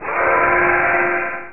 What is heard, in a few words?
Teleport; parasite